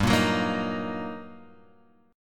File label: G Minor Major 7th Sharp 5th G, Guitar, Open G; chordshape; down